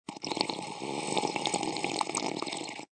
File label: Drink Sip